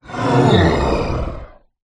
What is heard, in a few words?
horror evil demonic growl